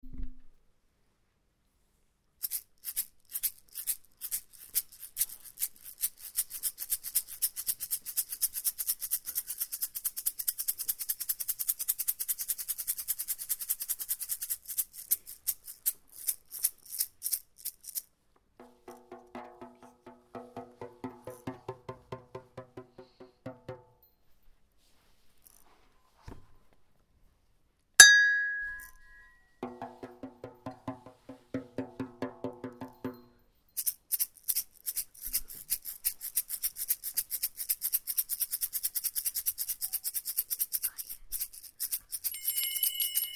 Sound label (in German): Eisenbahnmusik